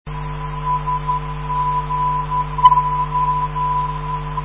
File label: sgo 356khz, ndb